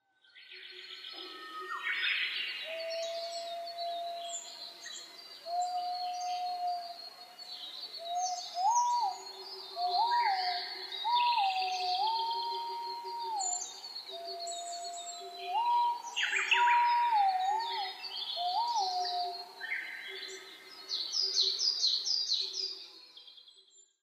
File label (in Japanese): aobato